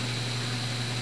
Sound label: click, Robots; Gunlok